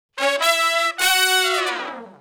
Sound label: Shuffle Blues Riff (F#) high unison falloff, Horncraft for R&B; Sections